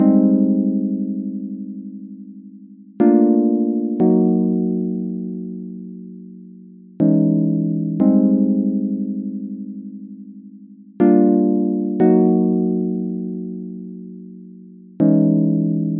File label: 120 pretty minor epiano chords; KEYS ORGAN PIANO LOOPS